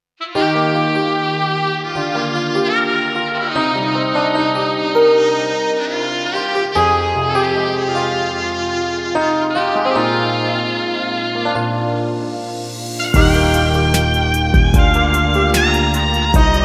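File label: power ballad sax version; By Burak Sound Bank; dramatic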